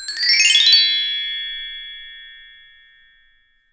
BRS MRK, TREE BELLS; Voice Crystal - Steve Reid Definitive Percussion

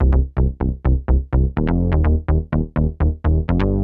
Soft 125 C, bass; musicradar; bass boutique

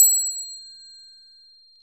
PRC BRASS, Finger Cyms; Roland LCDP03 Orchestral Perc

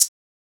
hat hhhattt; neuro; Sinistarr; Drums

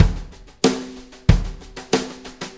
Brushes Loop, Full Kit Loops; Sonic Foundry (Sony Creative Software); Loops & Samples Drum Tools

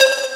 Synths Root C

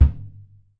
Surdo hand mute; E-MU Producer Series Vol. 2; More Studio Essentials; Composer

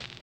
RAGGA; Spectrasonics; Metamorphosis; RAGGAVYNL

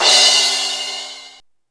SP1200; DRUMMACHINES

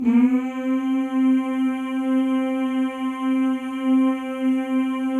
male; Spectrasonics; Symphony of Voices

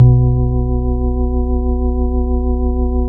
FAST LESLI, Bass Analog & Synth; USB Soundscan vol.12; AKAI

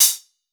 Crisp Angry B, Hats; Airwindows

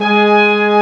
BRASS G2; AKAI S6000 CD-ROM - Ultimate

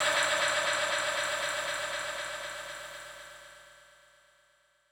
Syn Fx Loop 125; Equipped Music

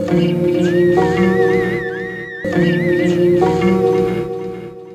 Melody, Hip-Hop

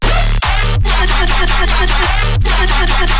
bass loop 150 D; Virtual Riot

